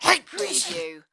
Sneezing, Male Sneeze

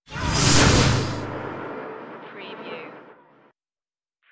wormhole transformation turn

